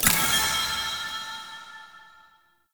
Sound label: UI Foil Mod Preview Upgrade; Mods